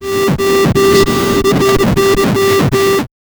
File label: retrowave sound on window